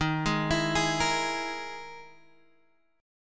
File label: E Major 7th Flat 5th D sharp